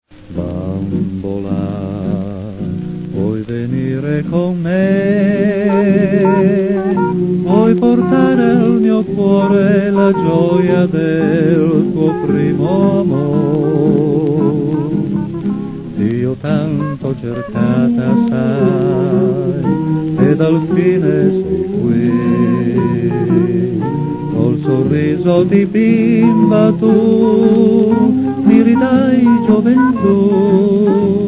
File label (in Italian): QUINTETTO VOCALE HOT